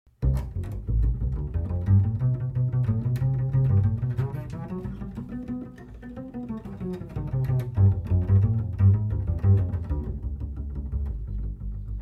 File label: Bass tension short